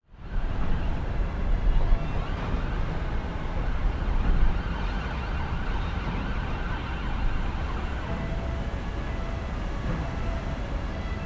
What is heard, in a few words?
ambience city london uk city drone sirens construction on jetty river thames